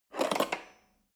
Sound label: cutlery fork drawer handle scrape